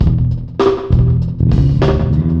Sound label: music, ambience